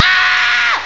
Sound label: ahhh